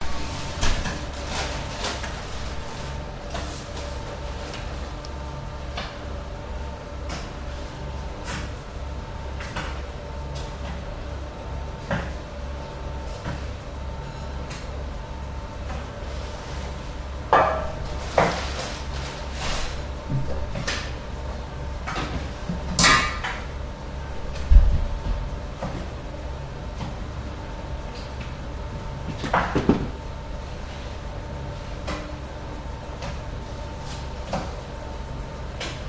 Bakery Baker Preparing Dough Dough Mixer Running In Background; German